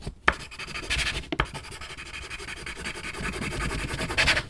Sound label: scribling with a crayon